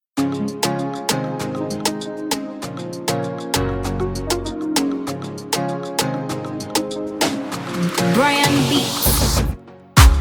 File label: Instrumental